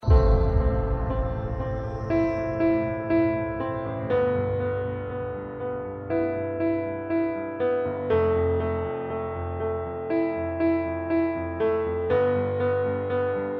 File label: Vivo Vikings